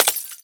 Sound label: glass window pane piece break; Explosions